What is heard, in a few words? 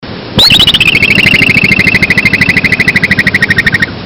woodchuck; fr